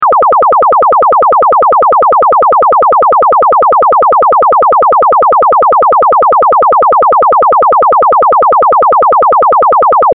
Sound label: chirp; chirp toys